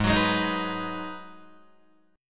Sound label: G sharp Minor 7th G sharp, Guitar Open D